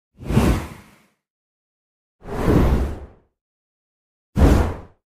to push; هل دادن

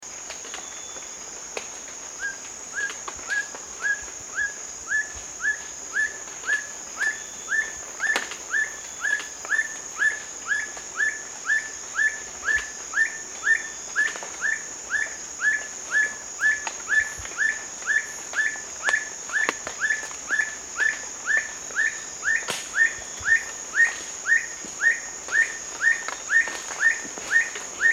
Black Bushbird; پرنده بیشه مرغ سیاه

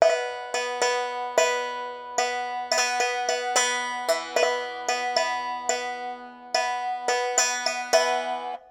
Em Rythm Pipa Lute, 110

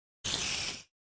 spider; Minecraft; ماینکرافت

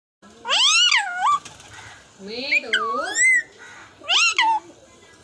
Alexandrine parakeet; شاه طوطی الکساندر

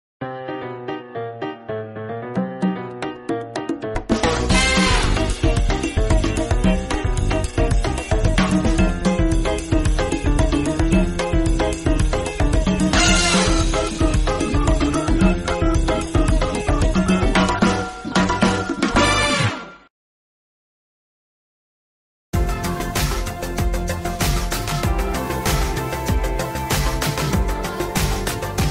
Salsa Ringtone

